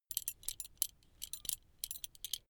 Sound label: metal belt buckle clatter long soft